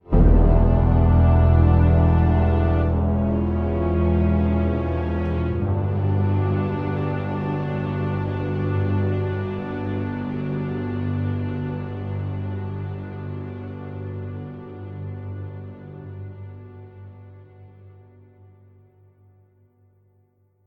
Mission Fail; World in Conflict

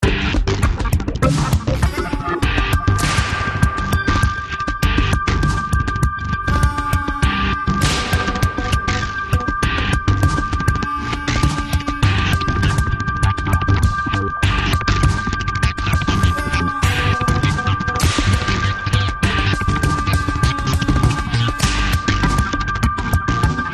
Loop Hacking Action

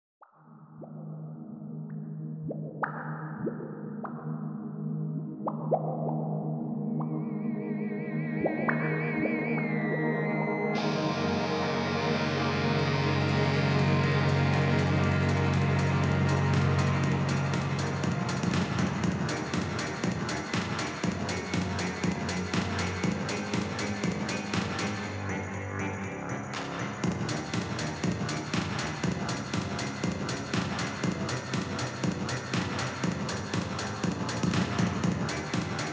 Plaza First Camera

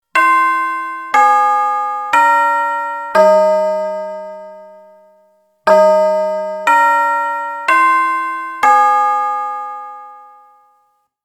Chime; android

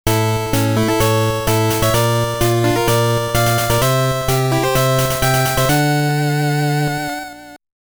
All Clear

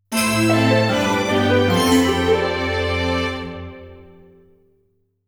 Defeat Jingle; Persistence

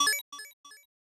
Menu Jingle; Dirt Racer; SNES

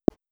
Mellow Tap; Universe Sandbox 2

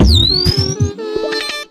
Jingle Funkyboard Slip; Rayman 3 Hoodlum Havoc; GC PS2 Windows Xbox

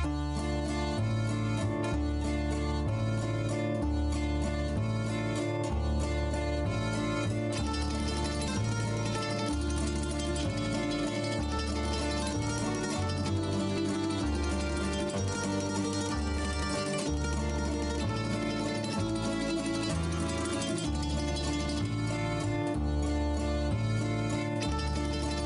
Background Theme; guns gore and cannoli 2015 pc

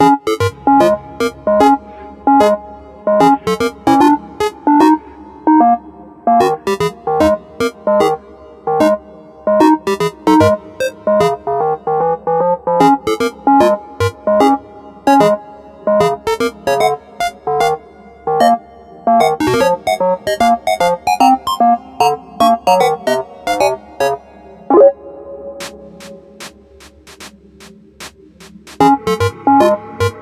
Spin The Roulette; miitopia

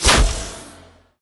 attack; endless world idle rpg gamerip 2019

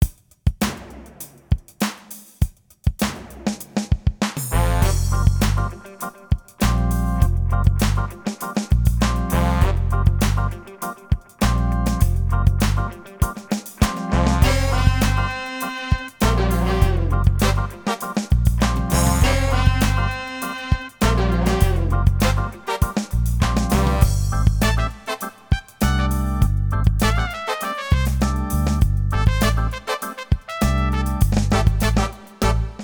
Duet with Sax

